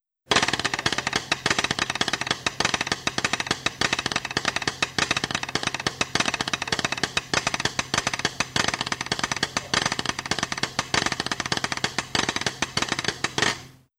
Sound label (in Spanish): Ritmo de habas verdes